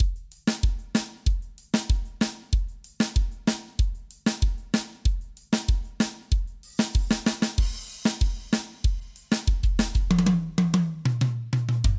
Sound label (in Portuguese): Vanerão